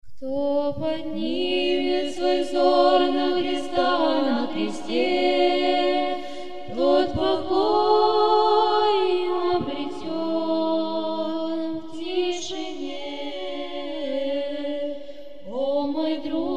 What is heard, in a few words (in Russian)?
Кто поднимет свой взор духовное песнопение